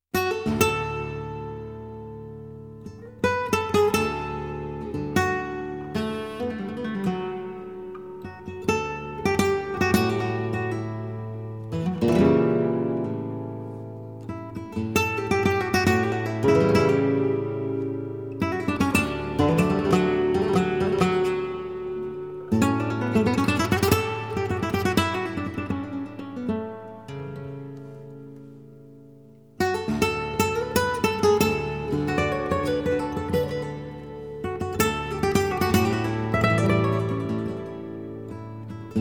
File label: Guajira, spanish guitar